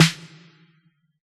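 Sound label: abstract kit snare; Dr 202 Kit 1; Percussion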